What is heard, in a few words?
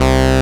SYN MC MIN, Roland MC-202; Sound & Vision - Gigapack I CD 2